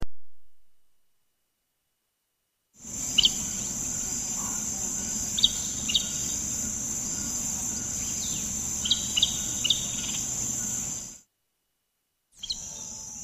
Many-banded Aracari Pteroglossus pluricinctus

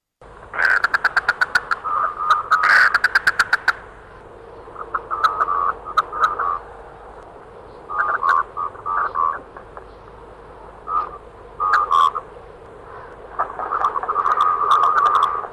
merganser

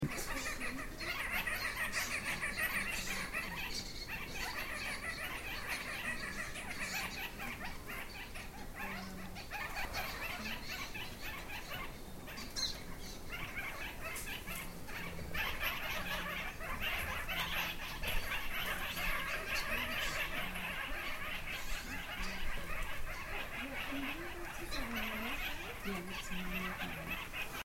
parrakeet

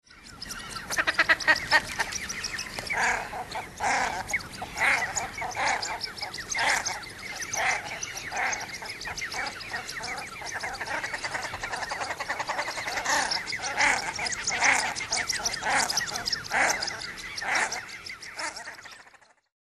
shelduck

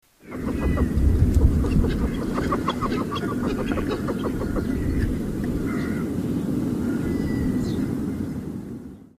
rosybill pochard

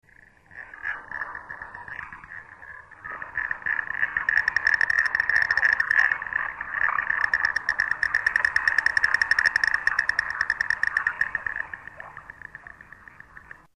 white-headed duck

